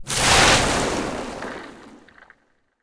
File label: Magic Spells Cast Long Frost; Ice; David Dumais Audio; Ice & Frost Magic 1